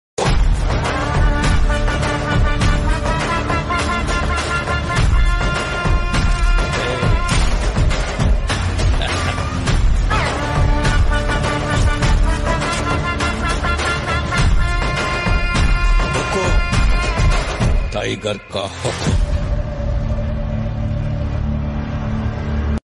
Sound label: ringtone hindi dubbed; jailer